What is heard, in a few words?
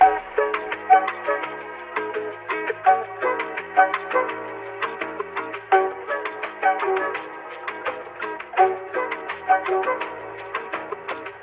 flute, Loops